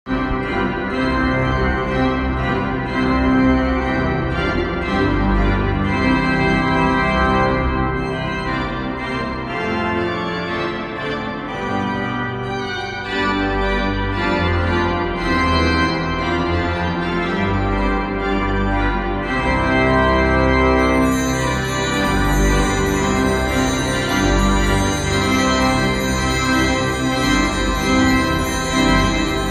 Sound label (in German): Rensch Orgel; Stadt Bottrop